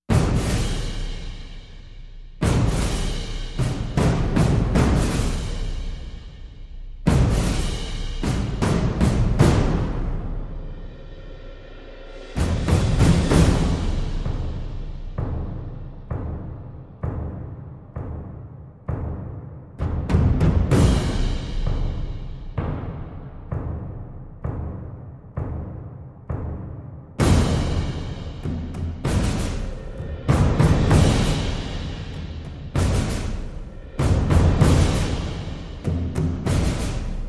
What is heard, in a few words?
Percussion Only